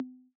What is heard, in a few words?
Analog Conga M, Yamaha DJX 2B